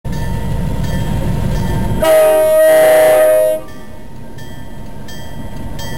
MP15 switching horn bell; lirrhorns

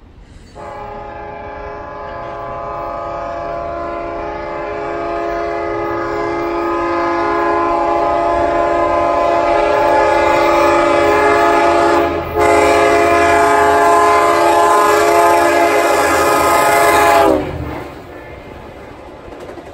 flying through, K5LL, horn; Mineola June 2019; lirrhorns

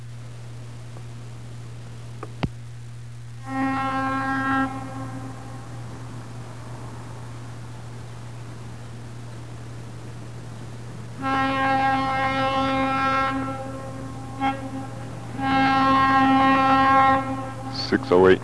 GP7; FA-608; Sea Cliff

